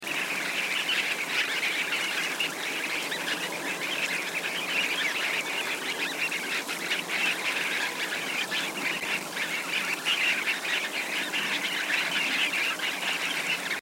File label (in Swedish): Karrsnappa